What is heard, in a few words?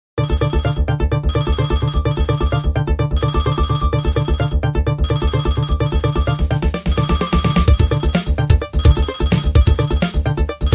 futuristic nokia 3120 ringtone